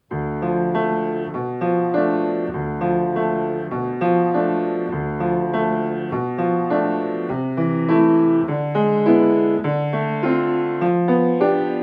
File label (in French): Accompagnement chant